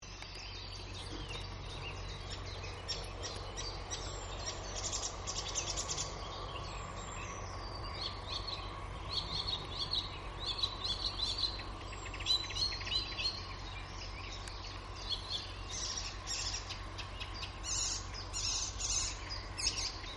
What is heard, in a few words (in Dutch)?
Spotvogel Hippolais icterina Geluid; Annelies van Klinken